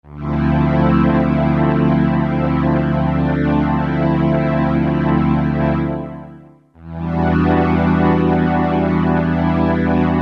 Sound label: STRING, Kawai SX-240